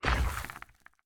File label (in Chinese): 监守者脚步声